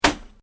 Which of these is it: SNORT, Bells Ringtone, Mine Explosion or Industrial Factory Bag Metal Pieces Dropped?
Industrial Factory Bag Metal Pieces Dropped